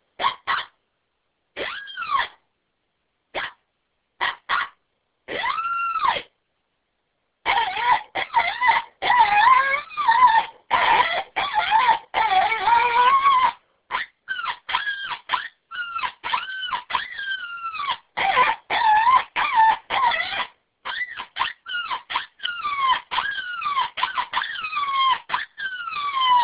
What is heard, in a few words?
coyote fight; hunters